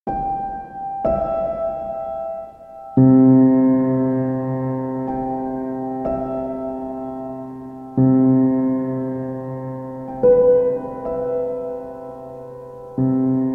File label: Piano Sounds